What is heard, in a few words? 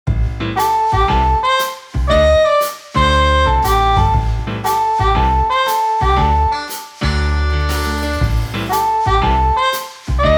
Steamy Travelling Mood Epic Battle; P4G